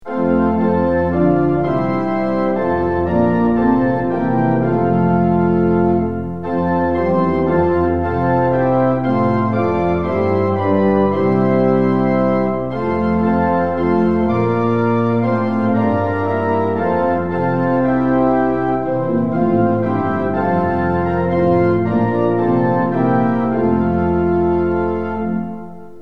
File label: tune; hymns; lillenas